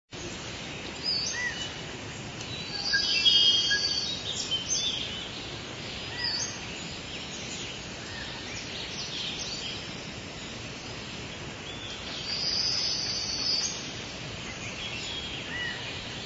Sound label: rainforest ambience; GlorySunz